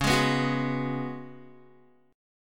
C sharp 7th Suspended 4th C sharp, Guitar; C#7sus4; strummed; tuning=4F,4A,5C,5G,5C,6E; guitar chord

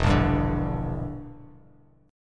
E Minor 7th Flat 5th, Bass Standard, Em7b5 chord; strummed

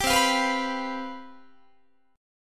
C Major 7th Suspended 4th, C, down, Guitar; strummed; CM7sus4; 22,20,20,21,21,22; 4A,4E,5A,5D,5G#,6C#

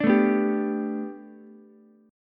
A 7th Suspended 2nd Suspended 4th Ukulele; A7sus2sus4 strummed

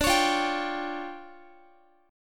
D Minor Major 11th, down, Guitar; DmM11 strummed; JGuitar

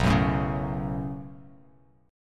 B 7th Suspended 2nd, Bass Standard; B7sus2; strummed; JGuitar

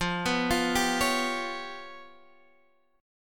G 7th Flat 5th

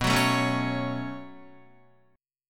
B 7th Suspended 2nd, down, Guitar; B7sus2; strummed; JGuitar

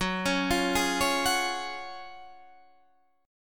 F sharp Major 7th Suspended 2nd, F sharp, down

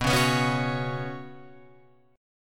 B Suspended 2nd, Guitar, Open F; strummed; JGuitar

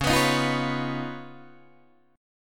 Db 9th, Guitar, Open D

